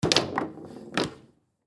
Foosball Table; Foosball Table Kick Ball Block; Games

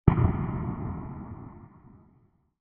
Far Away Explosion; Explosions; Crafted Signals

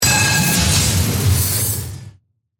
Industrial Hit, Metallic, To, Whoosh, Slice; Production, Element, Imaging, Accent, Transition; Factory

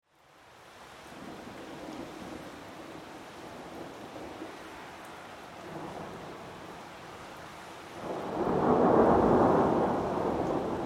Weather Forest Distant Thunderstorm Ambience

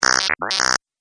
Alien Robot Game Fx

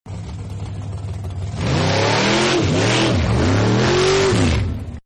Hot Rod Revs, car; Vehicles; Partners In Rhyme

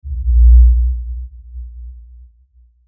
Sweeper Deep Bass Swell; Production Element Imaging Element Accent Transition

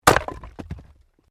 Wood Chopping Axe; Objects

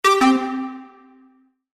Chime Musical, Chime Musical Multimedia

